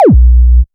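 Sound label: MISC Left, Techno 138 BPM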